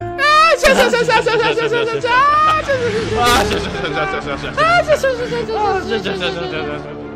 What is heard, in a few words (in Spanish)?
jajaja